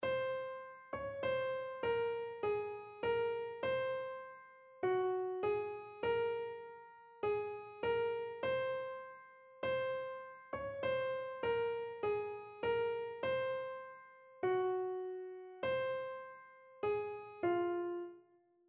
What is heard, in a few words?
mode identification